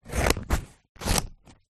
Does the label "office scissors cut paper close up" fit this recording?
Yes